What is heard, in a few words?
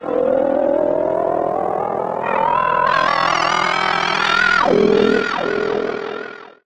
Warble Pulse